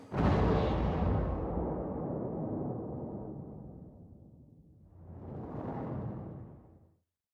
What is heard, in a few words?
helicrash; Helicopter; crashes bombs misc; Zomboid; Expanded Helicopter Events